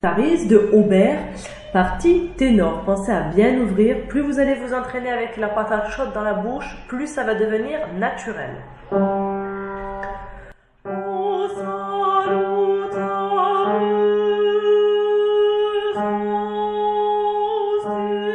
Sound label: Tenor; O Salutaris; Auber